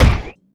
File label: heavy metal, footsteps; player; heavyfeet; Dystopia